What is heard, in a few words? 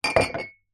cups mugs x2 set down table; household; zapsplat